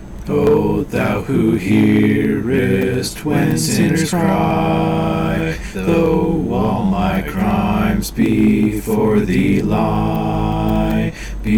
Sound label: harmony